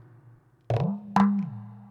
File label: PERC LOOP ETHNO 125; eJay